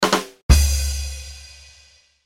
joke drum sound

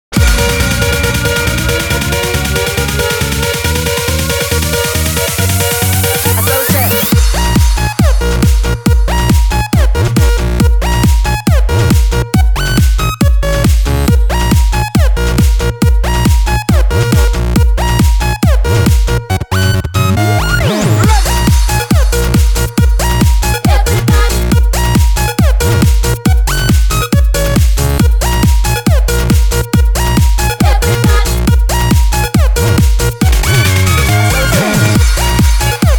melody, nonstop melody mix

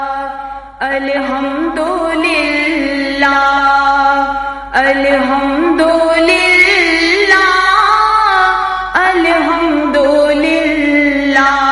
Naat In Female Islamic

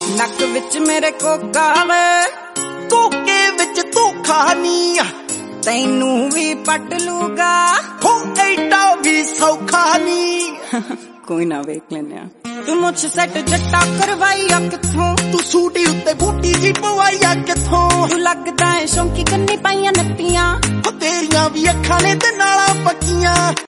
Punjabi

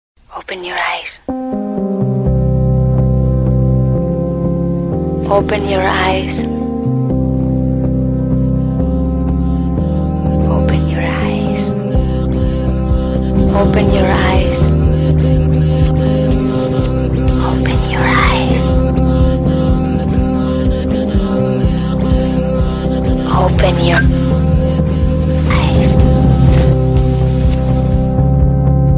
open your eyes alarm

